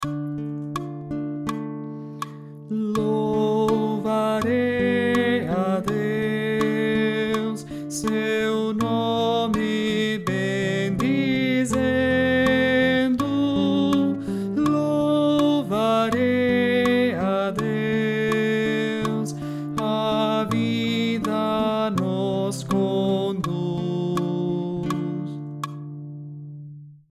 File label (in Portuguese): tenor